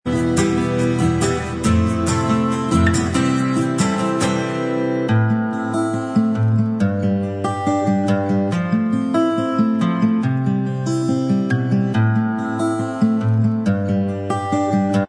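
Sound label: acoustic Instrumental Track, backing tracks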